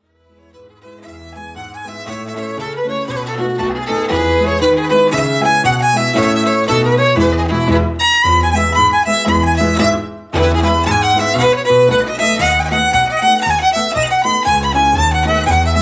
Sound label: Cape Breton jigs